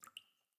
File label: Cave Random Drips; Devour; The Inn